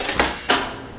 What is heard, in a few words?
button; Garry's Mod; hl2